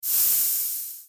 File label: Steam; Candy Crush Soda